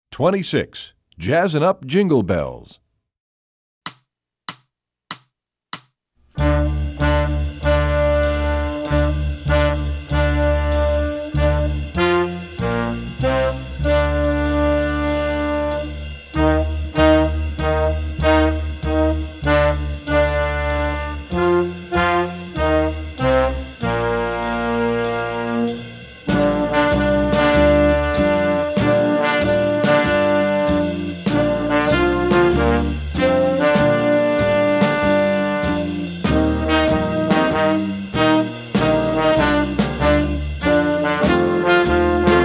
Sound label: jazzin up jingle bells